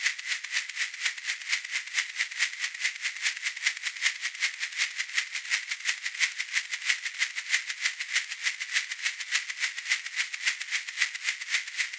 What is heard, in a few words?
mirror music